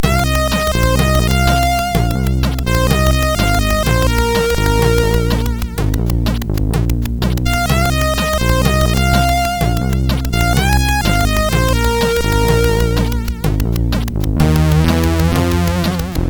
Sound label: MOS6581R4; hvsc